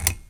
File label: switch